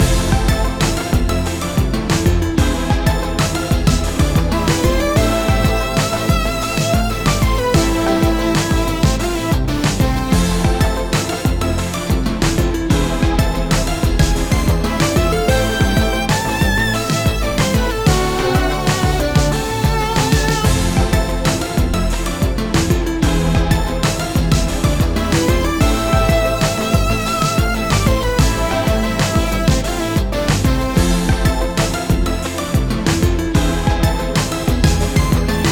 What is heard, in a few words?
BGM Club Boss